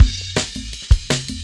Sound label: Jazz Ride A Cut, Drum Loops, 165.5; Zero-G Jungle Warfare 1, 2 & 3; 90 s Sample CDs